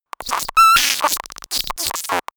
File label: glitch communication